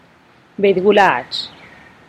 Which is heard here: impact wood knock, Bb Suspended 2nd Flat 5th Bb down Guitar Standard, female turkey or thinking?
female turkey